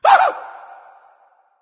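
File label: nonverbal surprised; smite; voicepack; ullr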